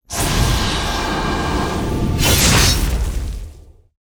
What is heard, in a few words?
BOSS Skill; Fabao